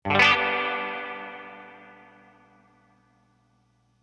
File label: spring bomblet hit; Headstrong spring